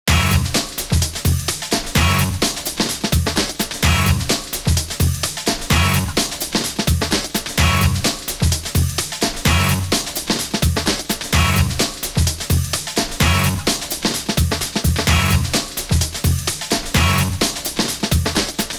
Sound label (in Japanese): ゲーム クイズ シンキング